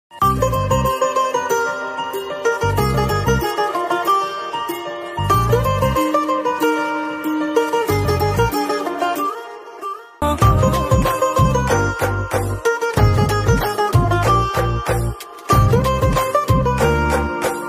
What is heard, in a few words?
bgm Telugu ringtone; alludu adhurs; bellamkonda srinivas; anu inmanuel